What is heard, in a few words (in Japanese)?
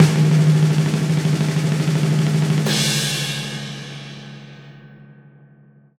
drumroll